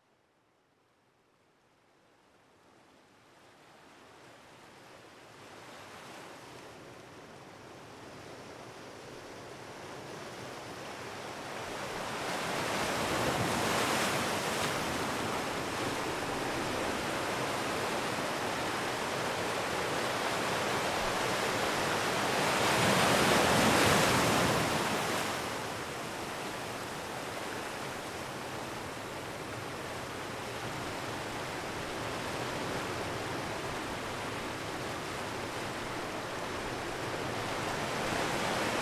Pleasant Beach, Ocean Waves

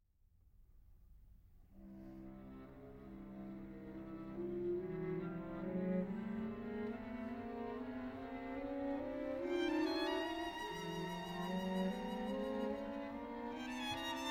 String Quartet, Allegro Grazioso; Artemis Quartet; String Quartet No. 1 'Metamorphoses Nocturnes'; Instrumental and Chamber

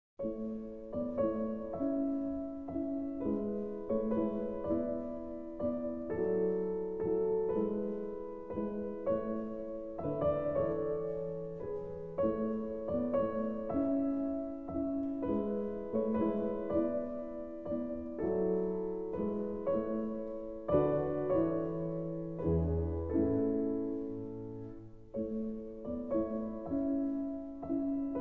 Piano Sonata; Mozart; K311

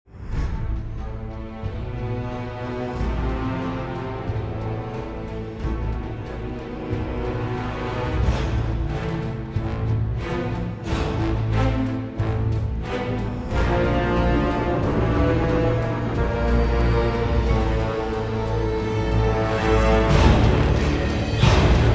Underwater March; Pirates of the Caribbean The Curse of the Black Pearl; Complete Score

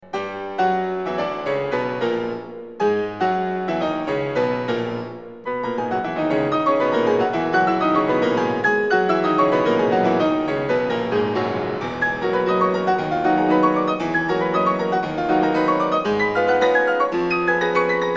Piano Sonata; Franz Liszt; Piano Sonata in B Minor, S. 178; No. 6, Stretta quasi Presto - Presto - Prestissimo

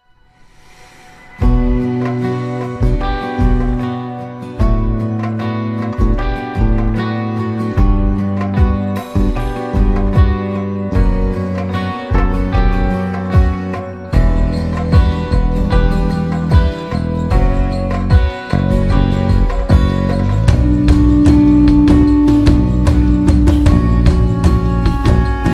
Epic Background music; Spartacus

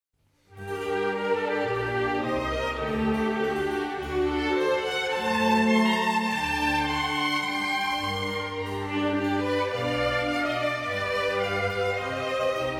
Concerto; Albinoni; Larghetto from Concerto No. 11 in C major, Op.10

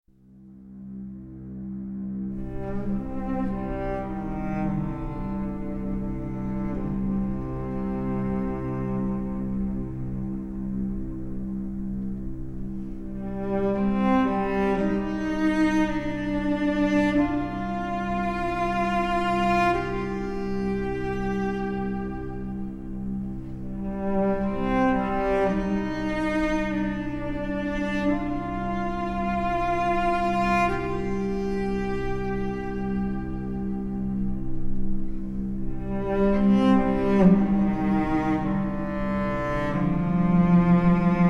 Cello Theme; The Great Gatsby

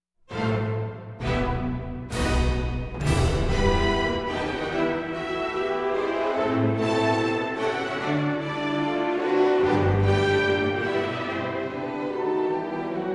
Orchestra; The Avengers

